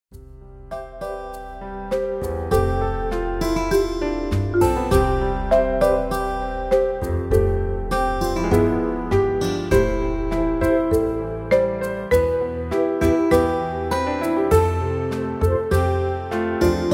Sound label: Instrumental